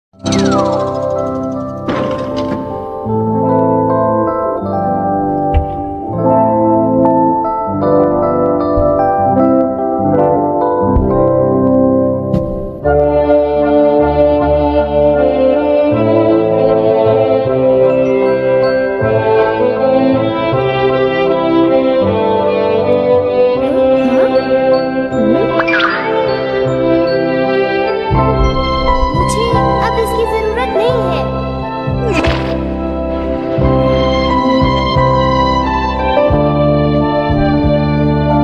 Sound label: melody bgm calm and relaxing music; doraemon